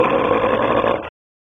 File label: Large Angry Dog